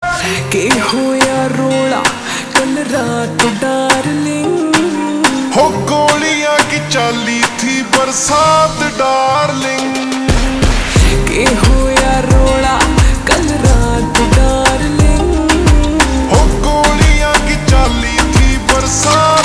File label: Ringtone Slowed Reverb